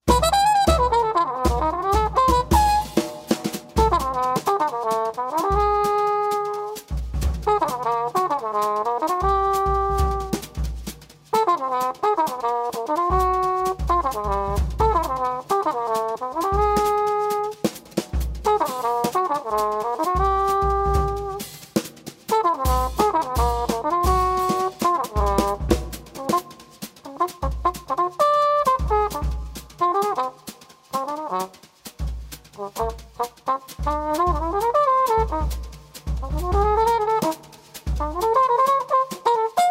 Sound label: blues